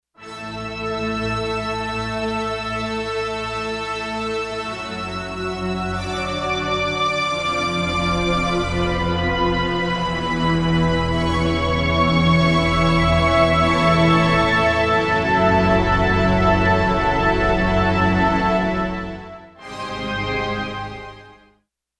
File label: Legato, synth